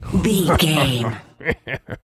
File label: voice fun man character deep laugh